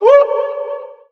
"woo"

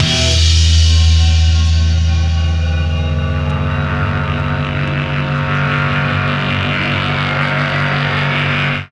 guitar to feedback

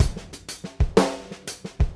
Drum loops Boombap

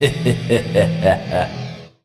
laugh satan; ooh ahh

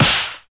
snare, electro; Snares

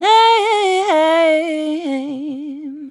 yeah SUNG

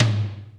Toms

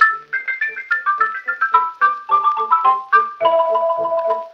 keys, Phonky

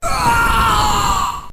scream

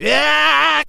"yeah", sung

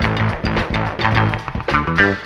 guitar, Loops, Phonky

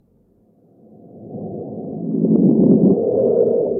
crackles; Rumbles

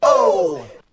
"oh", group of men; ooh ahh

